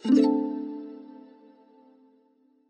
notification success; minecraft

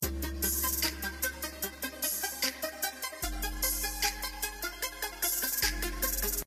ring streetgang; minecraft

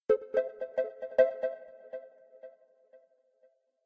quest success; minecraft

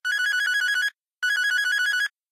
ring standard; minecraft

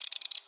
handschellen close; minecraft

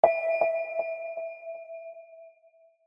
notification warning; minecraft

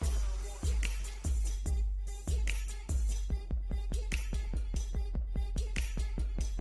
strip music; minecraft

